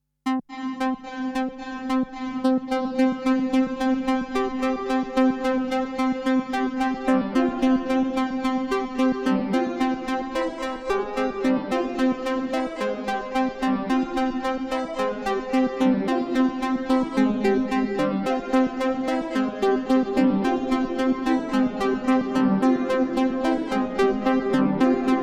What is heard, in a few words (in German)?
Modal Sequenzer Size Modulation